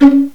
PIZZ; haunted; jazz jackrabbit 2

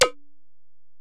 EPerc, Alesis DM-PRO; 300 Drum Machines

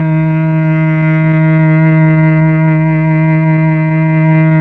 STR, Melo. Cello, Tron Cello; Roland LCDP09 Keys of the 60s and 70s 1

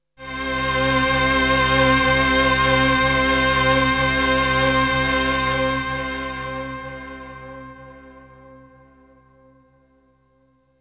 Melody oneshot; ZERO G - Datafile DISC 2

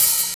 OPHAT; East West; Funky Ass Loops

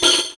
Brass, Tambourines; Roland BK-5; Percussion

